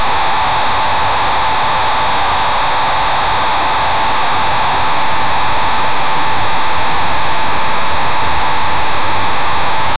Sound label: tv noise nervous